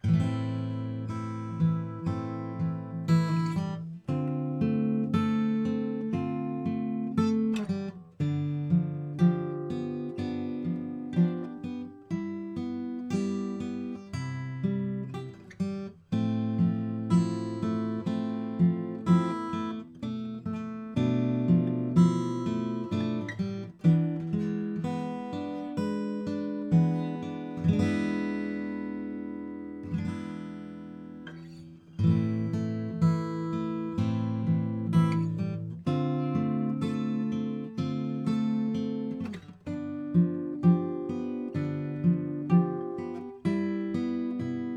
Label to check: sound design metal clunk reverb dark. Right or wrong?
Wrong